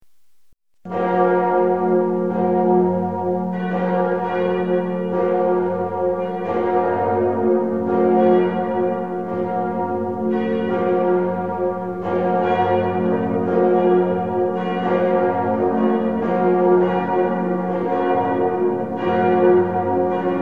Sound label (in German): Non – Glocken; Kathedrale von Dijon, Frankreich